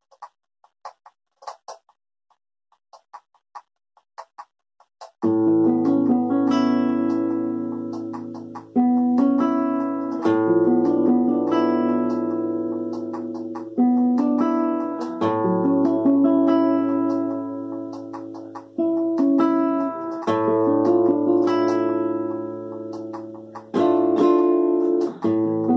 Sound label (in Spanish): Falseta de Guajira; Soniquete